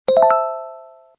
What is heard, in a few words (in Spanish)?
notificacion; wsp